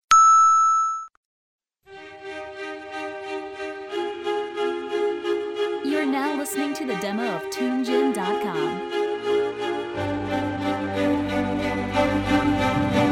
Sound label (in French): Valse